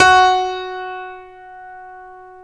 StereoGrand, Keyboards

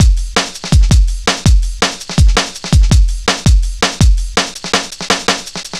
Drumloops, 165bpm; Drum Bass; Zero-G; Total Drum Bass

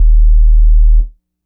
Dub Bass one shot, Basses; Electronic Point Blank

